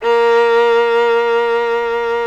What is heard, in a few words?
FIDDLE NOTES A#3; Country